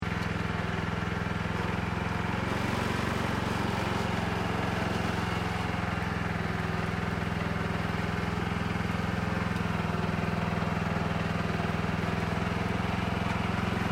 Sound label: Tractor Mechanical Planter Rice Field Operating Loop, Japanese rice planting tractor; Tractors